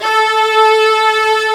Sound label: VIOLINS E3; AKAI S-Series CD-ROM Sound Library VOL-2